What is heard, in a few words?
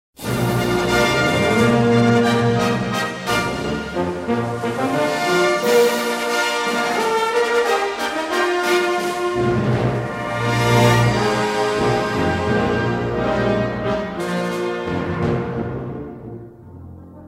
Fanfare And Flourishes